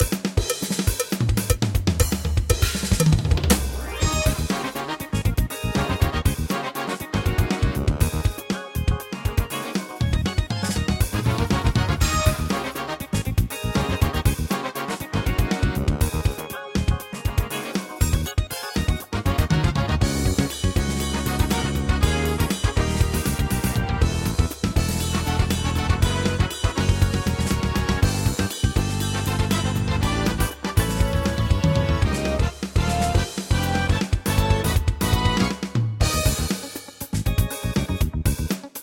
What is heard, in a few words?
Funk